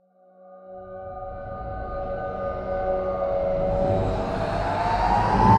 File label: controller psy aura l